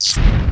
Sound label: undead wizard attack